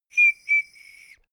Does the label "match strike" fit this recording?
No